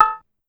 Cowbell CR, Pitched Up; Clavia DDrum-AT

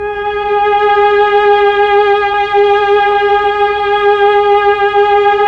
STR, Melo. Violins, Slow Violins; Roland LCDP09 Keys of the 60s and 70s 1

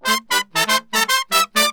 BRASS SALSA; Best Service ProSamples vol.14; AKAI

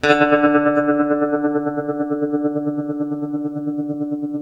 TREM D 2, Guitar, TREMELO; East West; Steve Stevens Guitar Samples Collection

